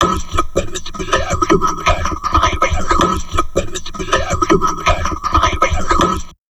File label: TALK, Drum Loops Crazy Processed; 160 TALK A; USB Soundscan vol.07; AKAI